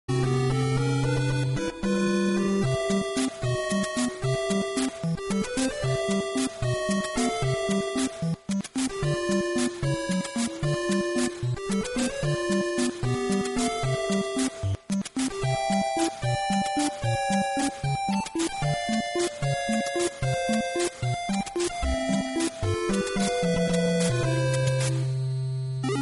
Super Mario dưới nước, nhạc chuông

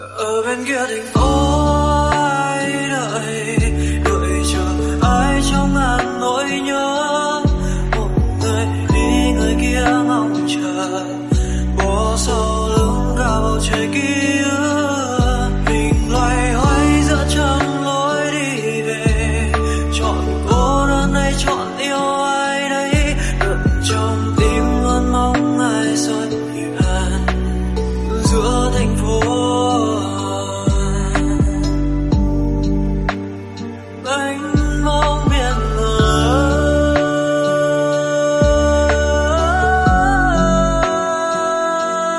Nhac Chuong Lofi

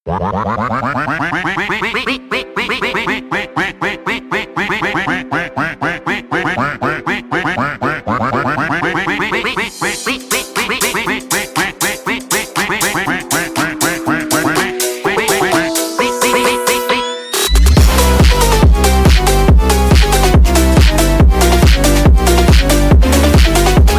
Nhac Chuong Duck Phone Remix

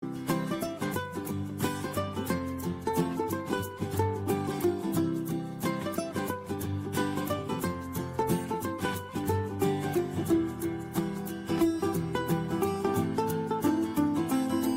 nhac chuong pubg mobile

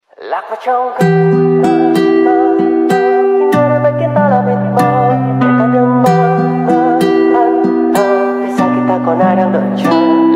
nhac nen; gori vietnam

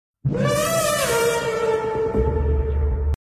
tin nhan sms; spiderman 2099

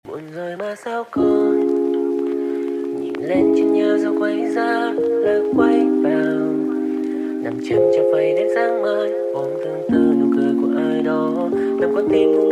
Nhac Chuong Lofi

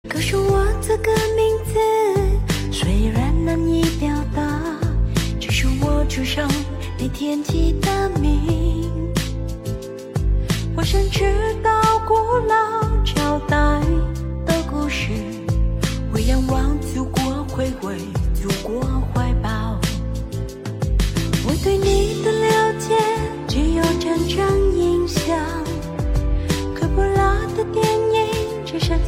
nhạc hoa, tiếng trung, chinese

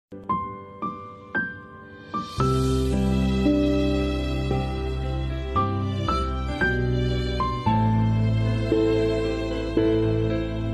piano khong loi

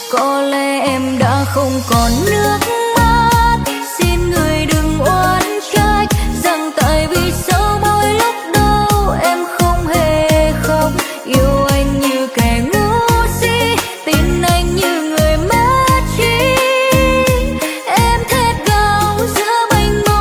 nhac chuong rumba ver

